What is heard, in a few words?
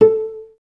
CLS PIZ A3, Advanced Orchestra; Best Service; Peter Siedlaczek's Advanced Orchestra